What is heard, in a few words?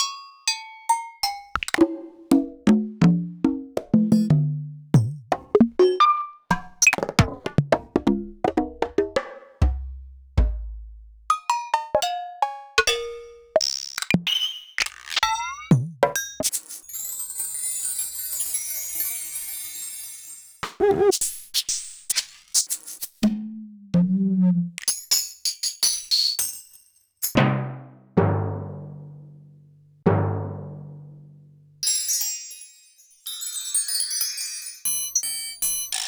Akai XR-20 Perc